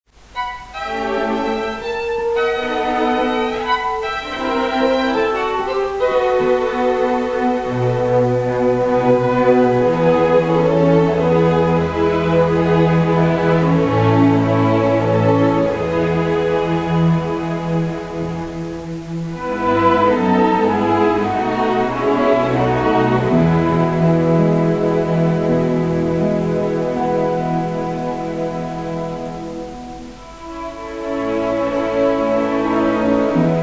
2. Violinkonzert 2. Satz Ende; Soyka

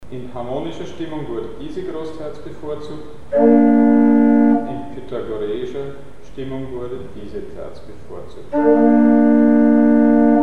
Je nach Tonsystem bevorzugte Terz; Mikrobuch

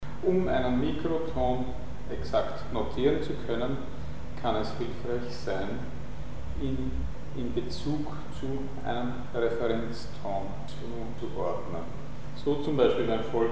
Notieren von Mikrointervallen mit Hilfe von Referenztoenen; Mikrobuch

